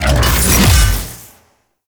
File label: Mech Stab Ability Throw; Nechro